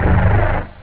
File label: terror, synth bass